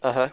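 earmuff "uh-huh", conversational grunts in English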